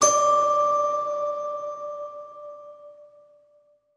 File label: Christmas Ping Lo